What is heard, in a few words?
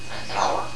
parrots